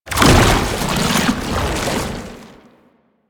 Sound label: Turn Half, Foley; Enemies; Warframe; Proto Infested; Babau